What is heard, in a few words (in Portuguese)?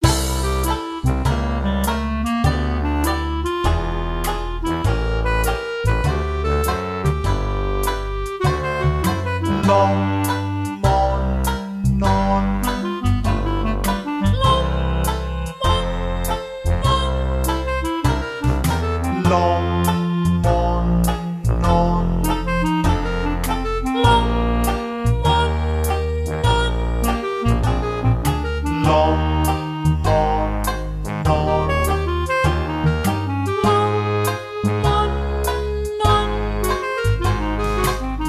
Vocalise Ressonacia